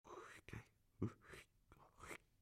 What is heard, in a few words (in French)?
ciseaux coupe papier; Fourniture